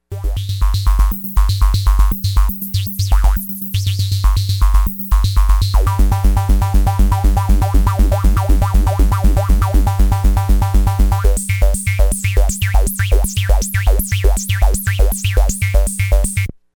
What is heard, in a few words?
seq, synths paradox; PEAVEY PARADOX